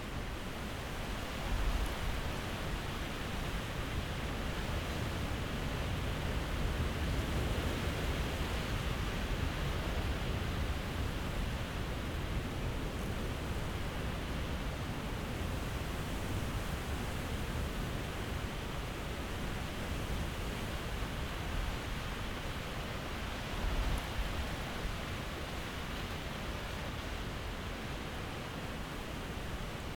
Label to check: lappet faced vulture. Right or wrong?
Wrong